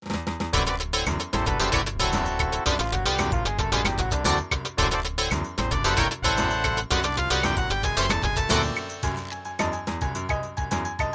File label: MIDI Ending